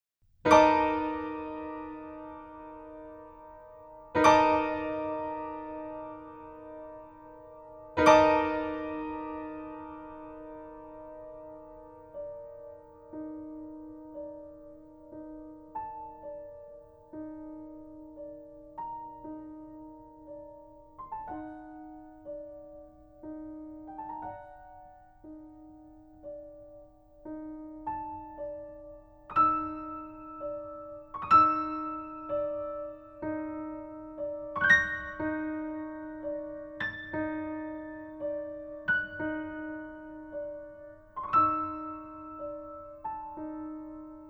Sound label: Final Bell; ronmaz